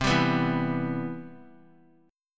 C Major 7th, chord; CM7 strummed; JGuitar

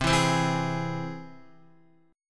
C Augmented, Guitar, chord; C+ strummed; JGuitar

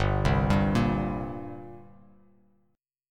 G Minor Double Flat 5th, G; arpeggiated; JGuitar

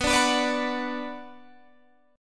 B Minor B Guitar Open G, chord

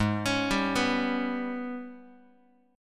G sharp Minor Major 7th Double Flat 5th, Guitar; arpeggiated; JGuitar

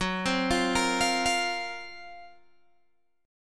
Gb Major 7th Flat 5th Guitar Open G

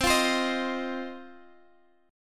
C Minor Double Flat 5th, Guitar, Half Step Down

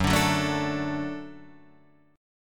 F sharp Minor Sharp 5th F sharp, Chord

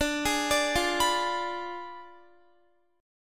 D Diminished 7th D down Guitar; Ddim7 arpeggiated

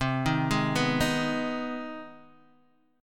C Minor Major 7th Sharp 5th, Guitar, DADGAD; down; chord shape

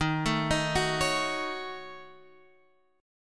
F sharp Minor 6th, D sharp, guitar chord

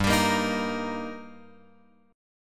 G Minor Sharp 5th G, chord; Gm#5 Chord {3 X 5 3 4 6} strummed

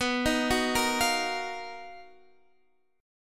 B Major Sharp 11th, B, down, Guitar Standard; arpeggiated; capo 9

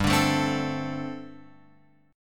G Suspended 2nd Suspended 4th, Guitar Open F; Gsus2sus4 strummed; JGuitar

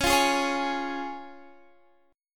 C sharp Major, Guitar Standard; strummed; capo 3; 21,20,18,18,21,x

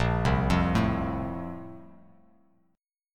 Bb Major 7th Suspended 2nd, Bass Standard; BbM7sus2 arpeggiated; JGuitar

